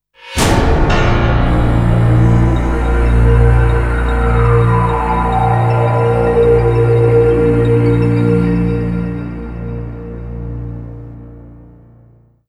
Mission Clear; Crimson Tears; PS2

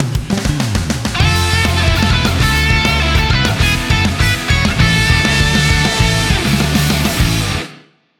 mini clear; no straight roads

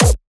Hardkick Nobass; exciplex sample presets pack; JinoBeats

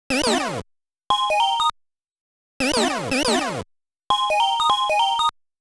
Hyper -Shot, Alien - Bound; Gaplus

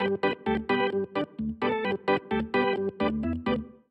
Diegetic Music Amusement Carousel Loop

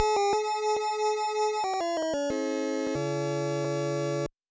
GAME START; Haunted House

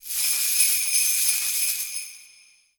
Enemy Holywood Active Heal

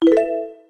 Se Chord

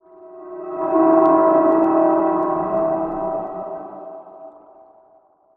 Woods Bridge Collapses

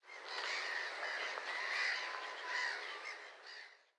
Ambience Menu Crow; Subway Surfers City; Android, iOS

